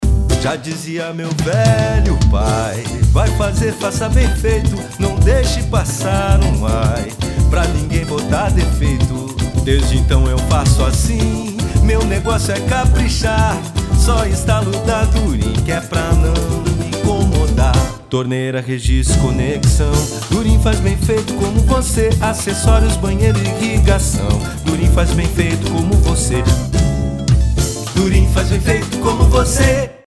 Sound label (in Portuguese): jingle samba